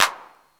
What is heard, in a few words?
909 CLAP